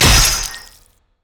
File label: shackle hook; LoL; thresh